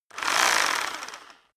rock drill hammer rev medium; maschinen; bohrer; soundpool

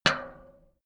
small metal drop; industrie wirtschaft; metall

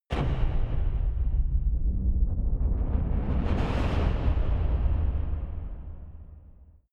massive metal rumble

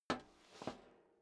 step boots metal rattle scuff; schritte; Footsteps

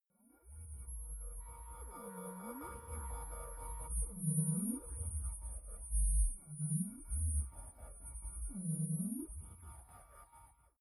space alien species call

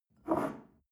shell grab from table, gastronomie; berufe; soundpool

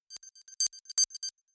computer sound, signale; soundpool

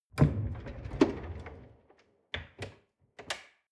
folding door open

